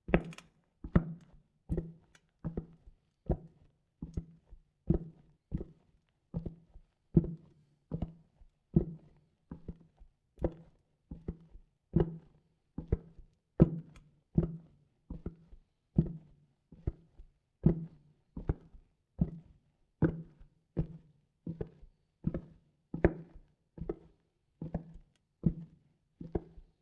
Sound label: Normal Wood Boots Walking; footsteps